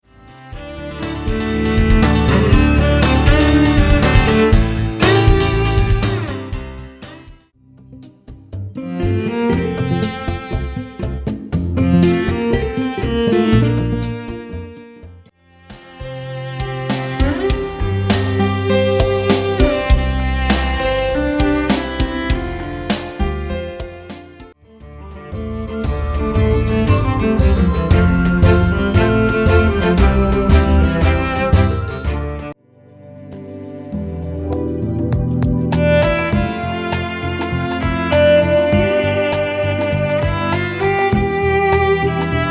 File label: CELLO